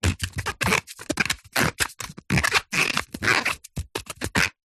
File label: food pear handling squeaks